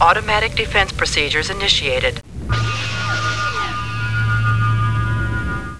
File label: lockup; Monster Media Number 15; os2; warp